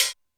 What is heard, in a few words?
Broken, Drum Machines Alesis D4